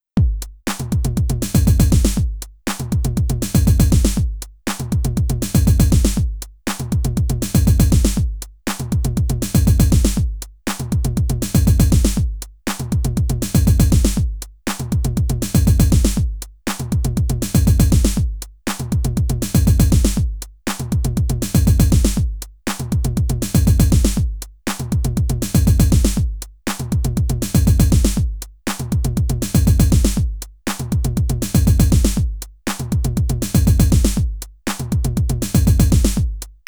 Beats

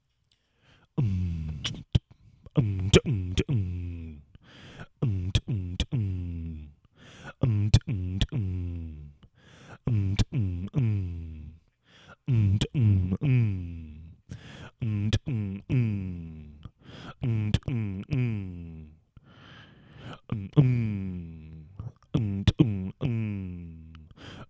Vocal beatbox and doowahs

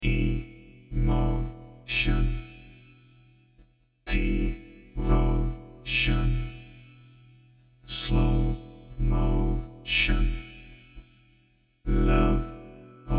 Em 120 vocoder emotion

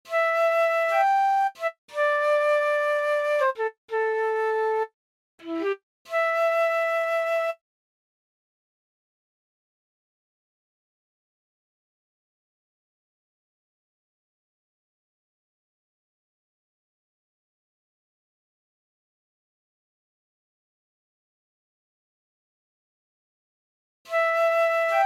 Blues Jazz A minor 120BPM

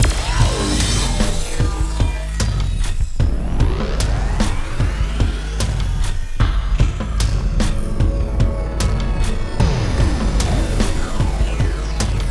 wooom

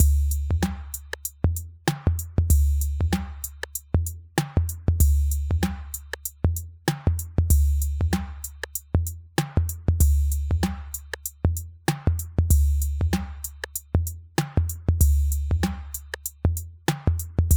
RD-8

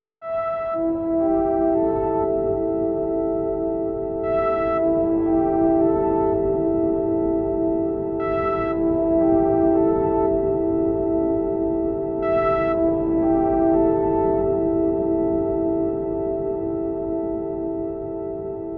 Am 120 synth riff; Cold Circle

